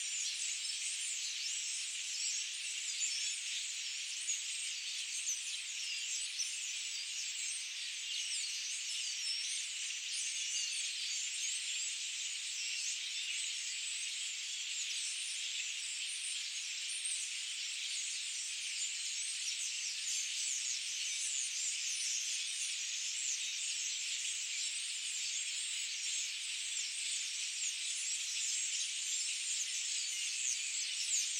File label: Birds Forest; SFX Producer